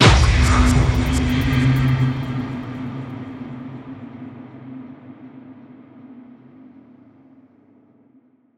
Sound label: Impulse; Ghosthack; SkyRealm